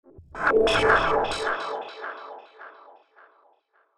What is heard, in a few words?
Dark Atmosphere, scary soundscape; horror sound effect; Andrew Fleming